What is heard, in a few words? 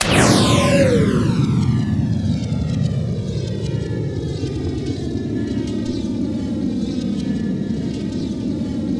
JETTISON, WARHEADS; Spectrasonic Distorted Reality 1; WARHEADS 2